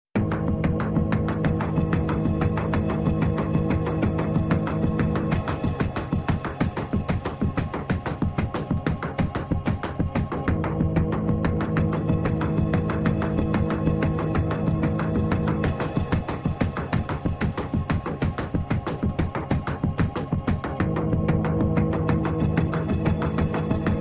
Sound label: Instrumental Version